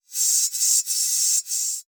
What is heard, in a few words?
Transition Metallic Warble